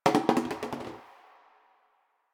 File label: Janitor Cart Plastic Bottle Drop; Warframe; Ambience; Nineteen Ninety Nine